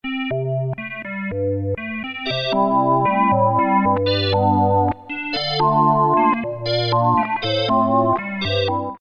Oct Jump, Roland MKS-50; Factory examples

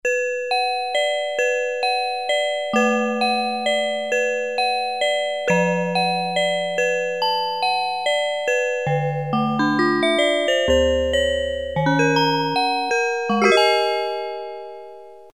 CARILLON, Casio CZ-1000

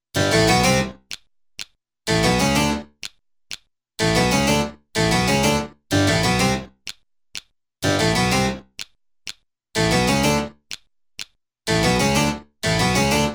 Harpsichord song

